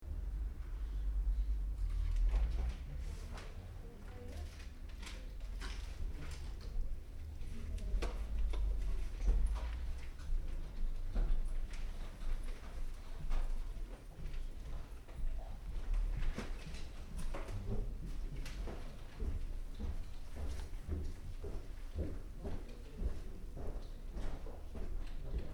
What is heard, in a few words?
Library, Interior Ambience: Small, Quiet; Buildings; Stuart Duffield